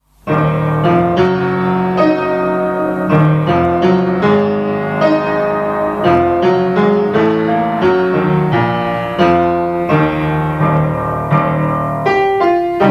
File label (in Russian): akkompanement pesni chasovye stojat 1 kuplet